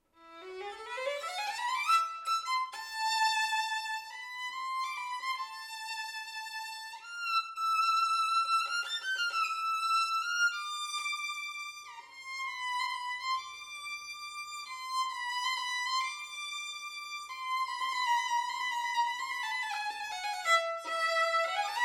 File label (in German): obertonreich zart klingend liebliche Note